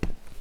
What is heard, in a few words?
footstep; fife-parpg; rio de hola